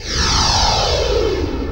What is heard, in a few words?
NOIS; XXL 800 Production Effects